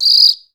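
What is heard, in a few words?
Brazil cricket, World Whistles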